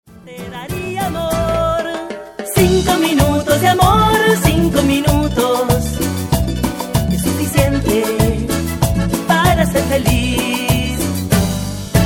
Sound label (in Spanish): cumbia negra